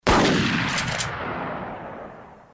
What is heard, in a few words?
Pump Shotgun Fire; Shooter